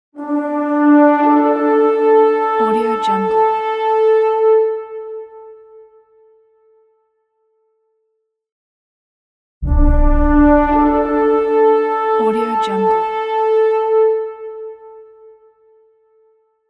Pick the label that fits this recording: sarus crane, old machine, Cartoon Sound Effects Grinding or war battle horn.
war battle horn